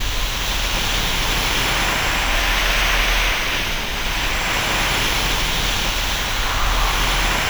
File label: STORMY SEA, VOCAL WIND; ILIO; Vocal Planet; Jazz & FX